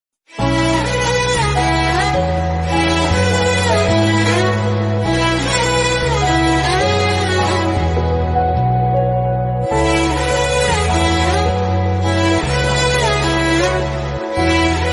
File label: movie bgm ringtone; premam; nivin pauly; sai pallavi